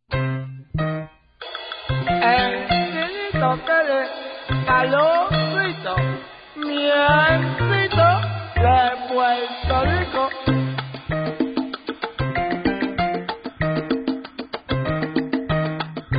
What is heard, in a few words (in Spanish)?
chinito musica; TALLARIN SALTADO TAY PA